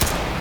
Snare; Lycoris Recoil

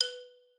xylo

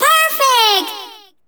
SE GAME BREAK; maimai

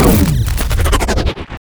fx cinema

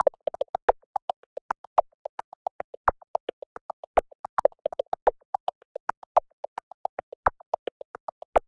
Dr Loop percus

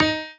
piano melo

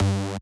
bass

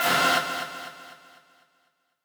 stab

